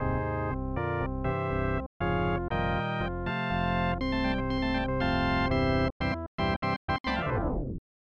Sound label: Double-Triple Play; MLBPA Baseball